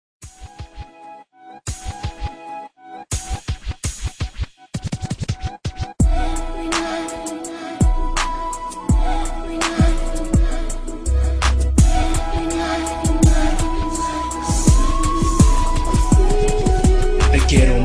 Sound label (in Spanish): rap romantico